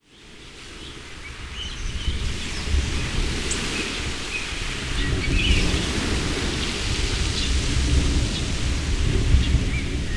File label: Bird Sounds Beach Wave Chirps